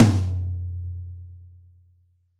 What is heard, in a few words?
Rock Tom, Roland BK-5